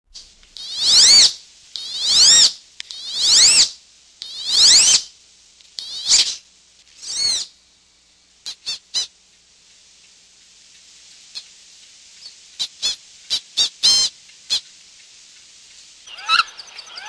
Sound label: wood duck